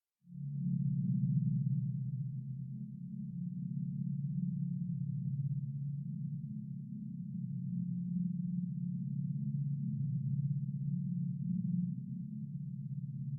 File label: ambient hum pitched